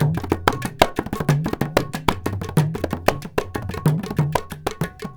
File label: India, Heart of Asia